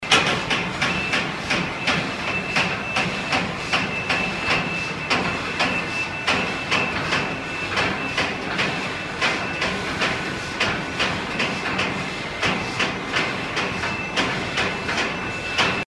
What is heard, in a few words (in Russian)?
Звук плохого подъемника